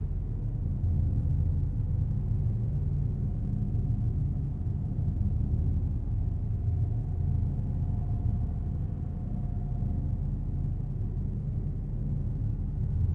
landing ship space ambience; Star Wars Obi Wan; XBOX

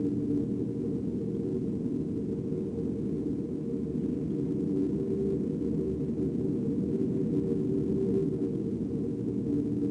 Tatooine wind, ambience; Star Wars Obi Wan; XBOX

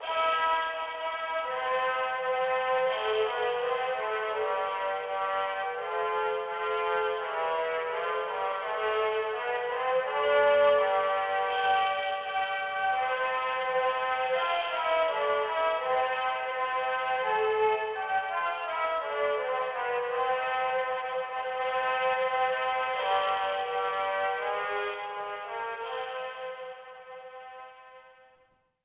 ANTHEM; Big Mutha Truckers

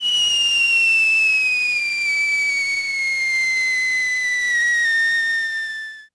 BMB SCRM

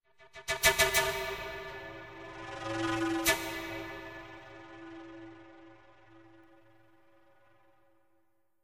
MUSIC pipe; Myst III Exile

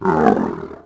Kent Call, Dino

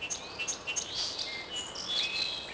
forest; Ambient; MX vs ATV Unleashed; XBOX

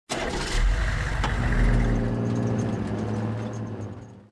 jeep start; Soldier Of Fortune II Double Helix; XBOX; ambience

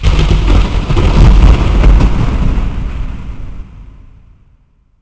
Thunder; The Elder Scrolls III Morrowind GOTY; XBOX

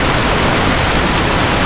hydrant spray; water; Tony Hawk's Project 8; XBOX

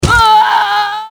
die; Soldier Of Fortune II Double Helix; XBOX

